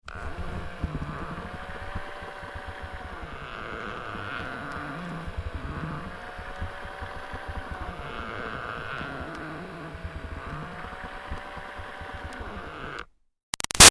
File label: Toy Baby Doll Motorized Moves Plastic Creaks; Big Room Sound